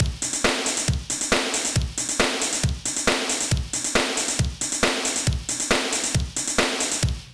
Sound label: Dynamic Drums Pattern; Oakvalley